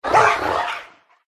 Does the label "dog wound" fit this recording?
Yes